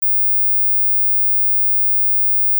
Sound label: 20KHz; Ultra garsai; 18 metu ir jaunesni; Pramogos; Muzika